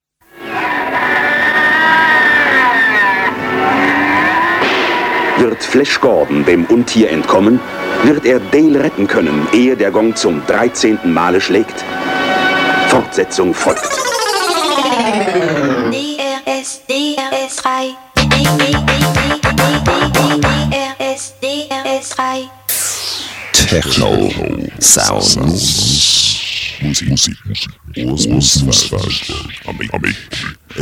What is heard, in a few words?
Techno Sounds Intro